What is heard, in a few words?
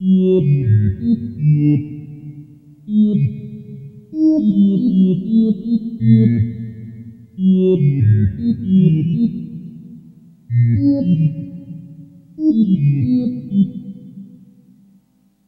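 Alien Voices Computer, drones & tones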